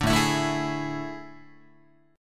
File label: A Major 7th Suspended 4th, Guitar; AM7sus4; strummed; JGuitar